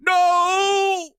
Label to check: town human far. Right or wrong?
Right